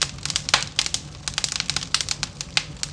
campfire

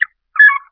bird

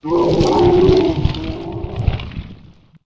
WARN

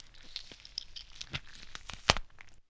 GLIBBER; mahlendur

